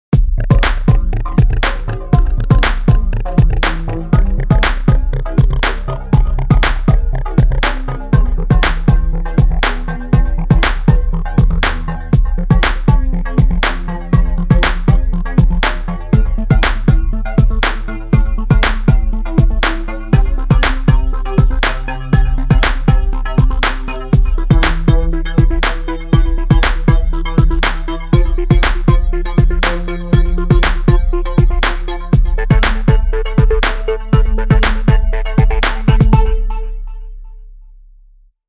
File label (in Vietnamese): Nhac nen video quang cao nang dong nhip dieu nhanh